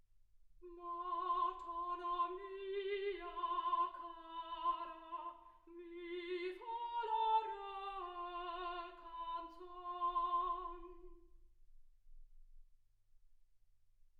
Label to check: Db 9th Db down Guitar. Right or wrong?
Wrong